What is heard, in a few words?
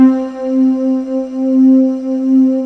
HORNS C4